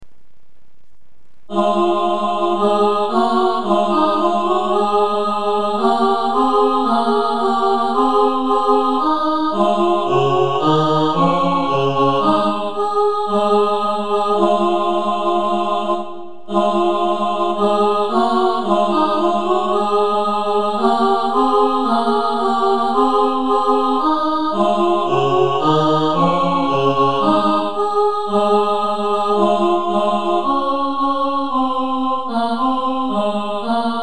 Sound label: sop2 tenor, choir